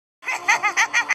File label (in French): Rire